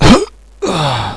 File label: pain; hostage